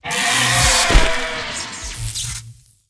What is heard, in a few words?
elecbird dead, monster